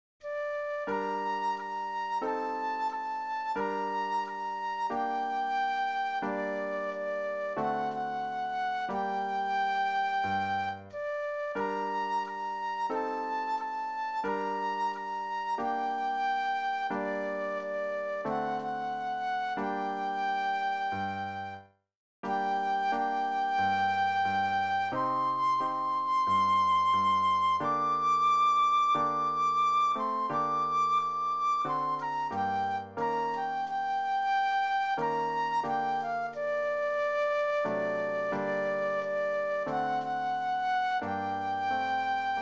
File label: Flute Solo; christian solos